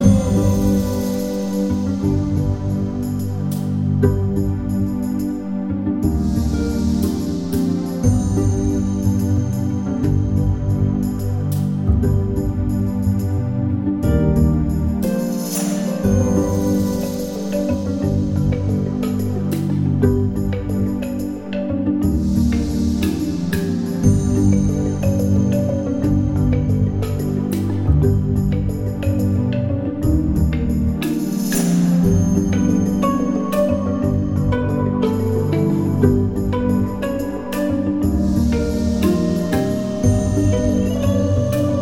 Climb to Woodburrow (Elemental Gate)

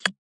downworld gooddry

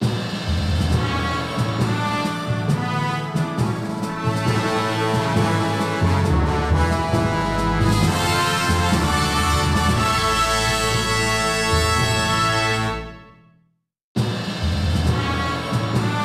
Finishline Fanfare

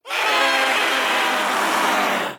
Ghost Jump Scare Vocal; The Town

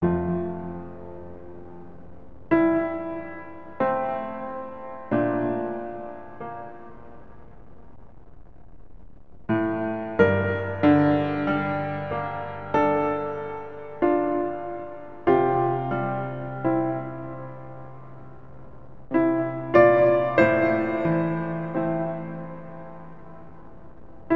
piano ver.; Xenosaga III

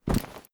Impact Generic Heavy; adams venture origins

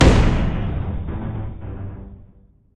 Music Stinger Event; oddworld abe s oddysee new n tasty

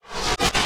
Morph Out

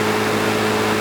Ford Gt High; car simulator 2

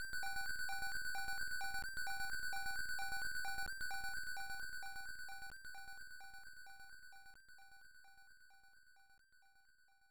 Unused PSG Jingle; pulirula; f2 system arcade; Foolish Code

